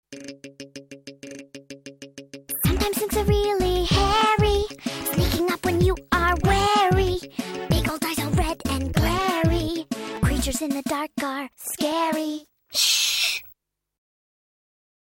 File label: Spooky Song